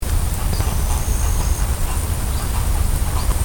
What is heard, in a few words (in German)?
graugänse